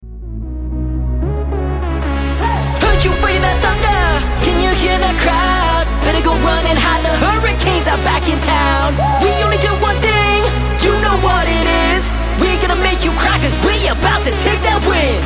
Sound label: Sample, male singers